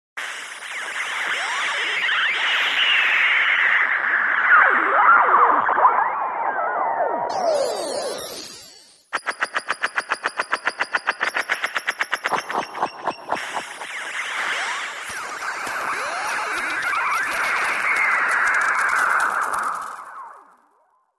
MADRADIO, Twisted Special FX; Universal Sound Bank; Soundscan 14 Twisted Special FX

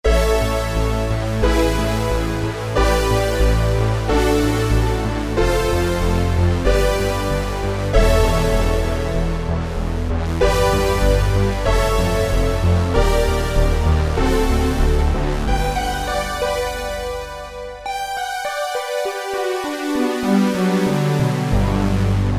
BIG PWM; Kurzweil K2000 Best of Analog

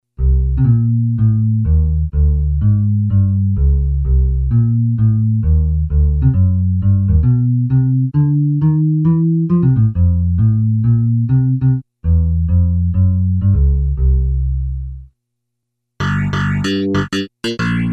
Bass, Casio CSM-1; Tones

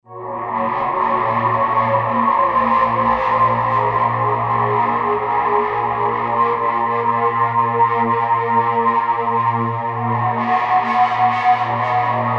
Distortion Pad, Roland SC-880; Factory Patches

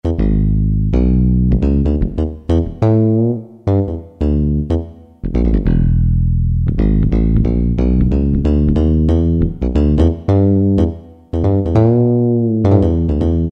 BA Fingr, Yamaha TG33; Factory Presets

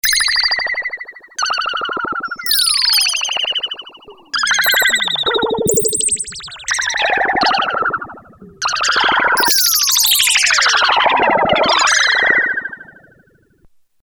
Rubber Knife, Prophet-5; Sequential Circuits Prophet-5 (Ver.3); Factory Patch; File 2 Programs

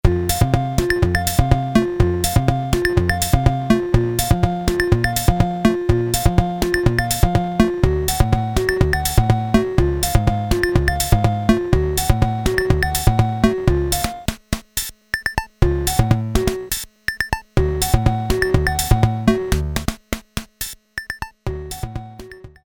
samba, Casio SK-1 Rhythm

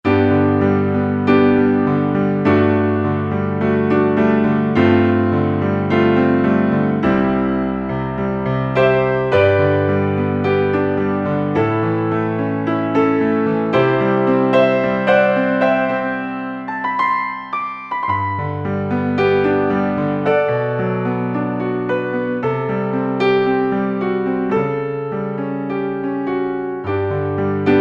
Grand

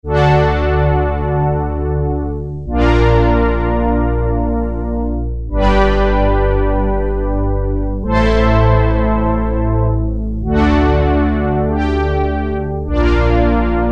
Orchestral Pad, Roland Juno-106; Factory Preset

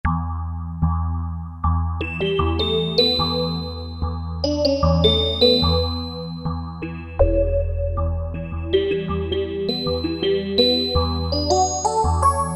D C O Wave, Roland MKS-70

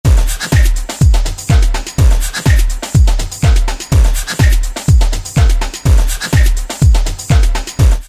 Human Bt 124; Dance; Roland SR-JV80-06

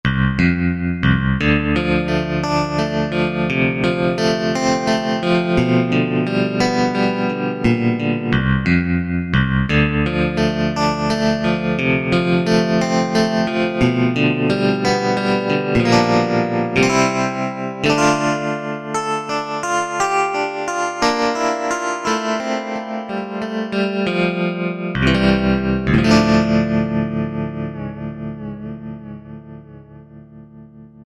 Gt-Silvertone, Yamaha EX5R; Factory presets

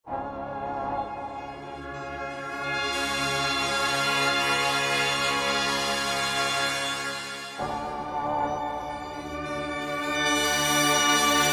Brass Pad, Roland V-Synth; Factory Patches

